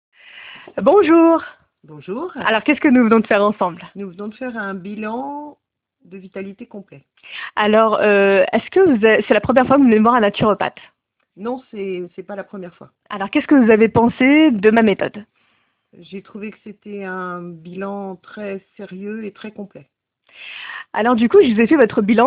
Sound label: vocal